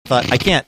snorting

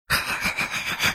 laughing like muttley

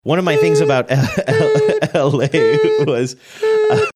making a truck backing up beeping sound

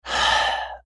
sighing

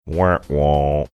imitating uh oh drop

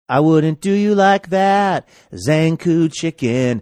singing i wouldn't do you like that

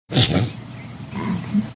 snorting

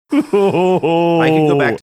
ho ho hoooo

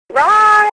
rawr

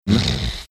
snorting

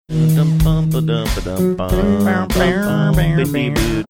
singing along funky bass; with blursday songs

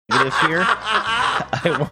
cracking up laughing

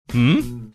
mmmh

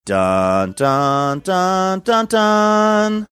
singing opening notes

